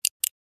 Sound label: Torch flashlight button press